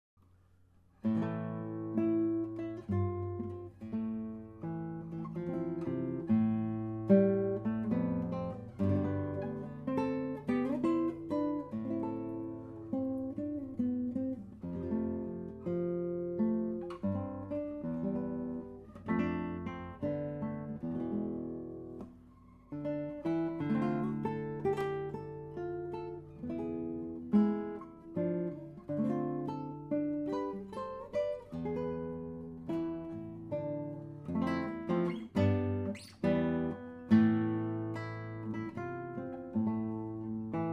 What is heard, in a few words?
medieval Spain sketch